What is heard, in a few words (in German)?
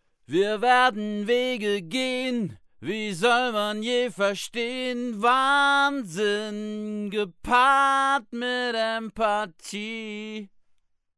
WA 47 Vox Kugel